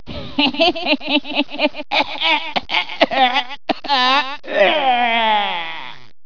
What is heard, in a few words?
laugh cough